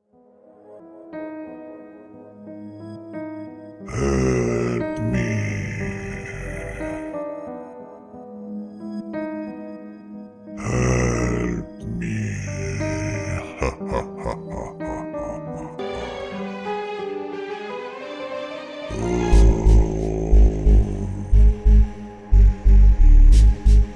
creepy girl singing help me please scary horror voice